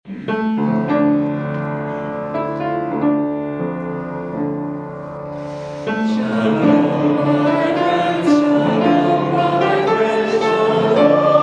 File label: Hymn; Shalom, My Friends (Shalom Chaverim)